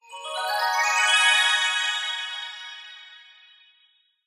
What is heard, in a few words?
fantasy magic glissando 90s style dreamy ascend